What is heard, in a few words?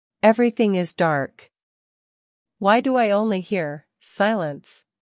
breathing; novafarma; intro enhanced